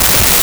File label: skratch